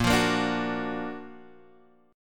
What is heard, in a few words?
F sharp Augmented Major 7th A sharp, chord